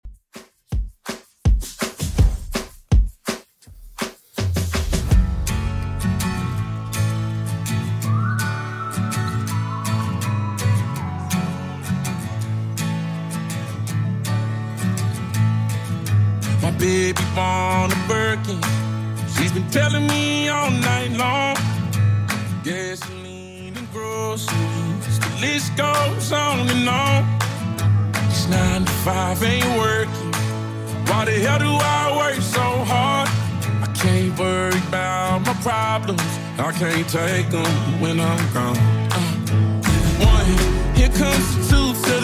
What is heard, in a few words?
Top40 Redrum 82bpm